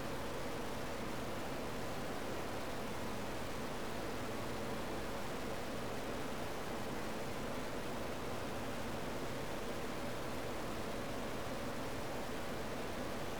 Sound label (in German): rauschen